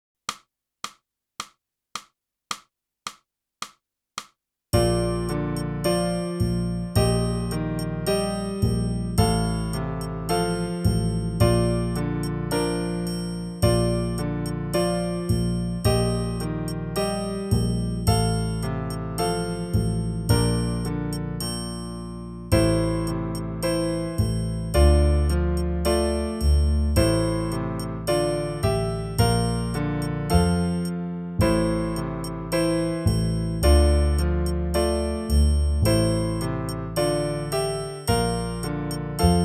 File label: clarinet; boosey method